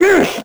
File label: dorian hurt; Crypt of the NecroDancer